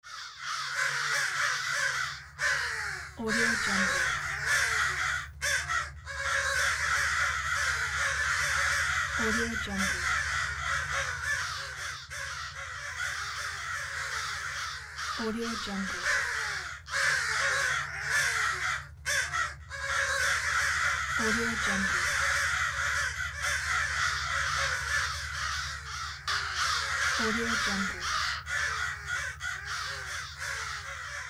crows fight

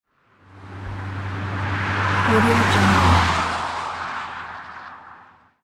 car drive by

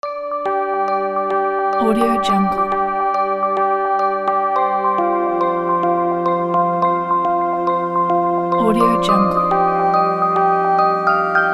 classic ambient

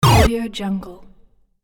8bit quick asset

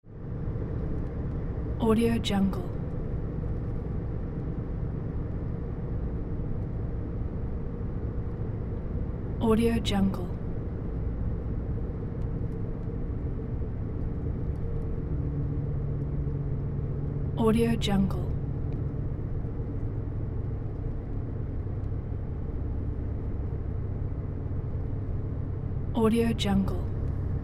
in bus

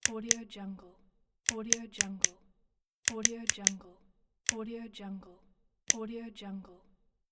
click pen

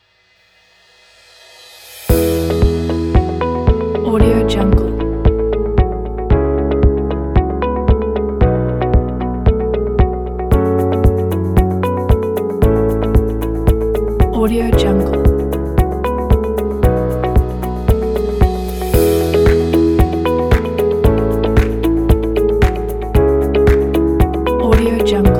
an uplifting corporate

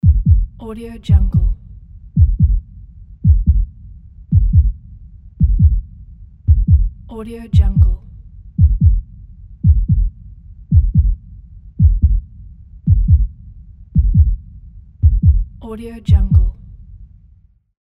heartbeat

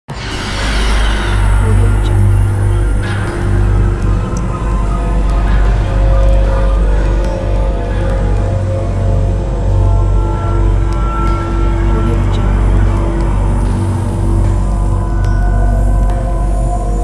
horror is scary

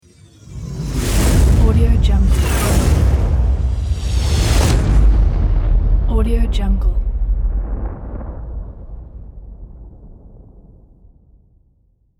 hit explosion

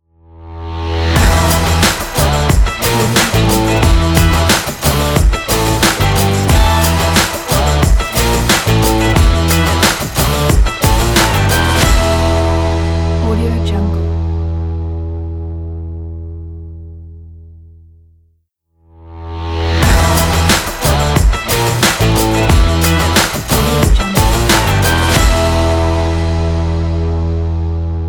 stylish rock logo

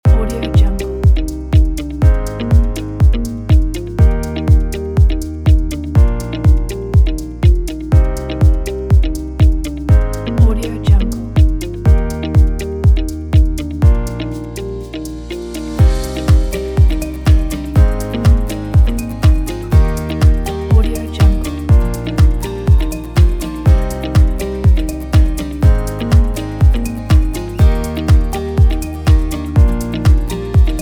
emotional

